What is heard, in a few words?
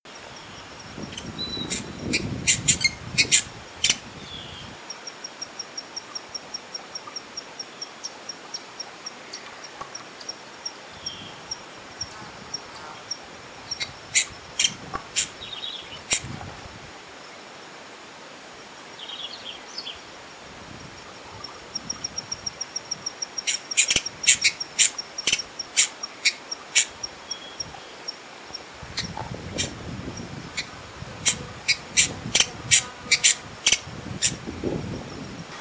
Hyacinth-visorbearer, پرنده آفتابگیر سنبلی